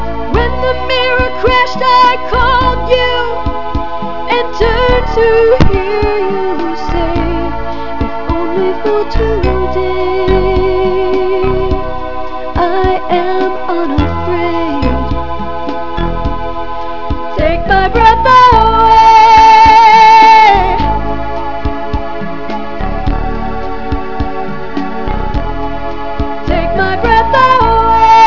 breath

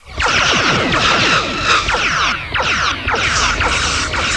phaser Shots

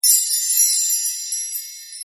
bell

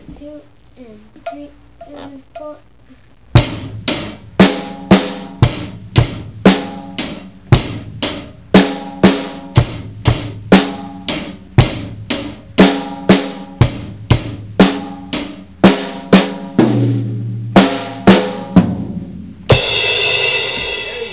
beat, drums

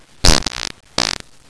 fart

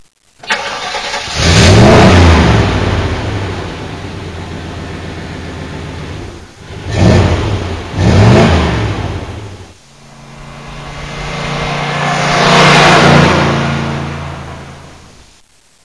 jeep

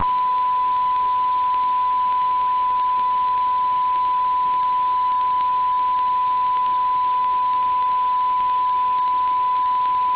tv test tone

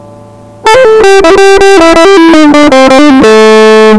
quick lick; guitar

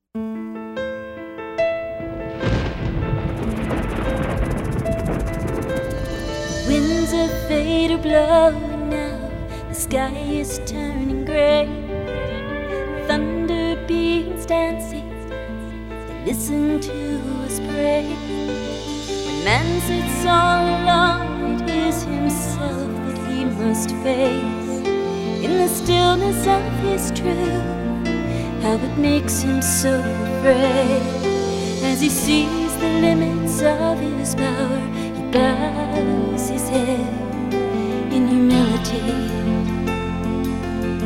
Thunder Song, lakota

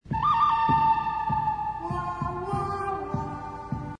Western, ringetoner

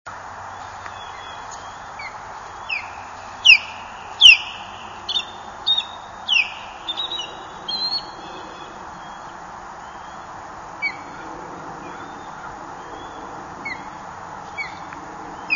osprey female loud; birdsongs

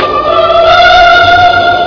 ahh

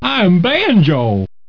banjo

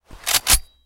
Reload

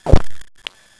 fart

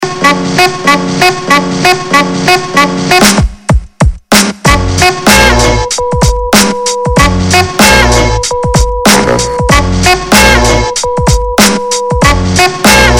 jazz

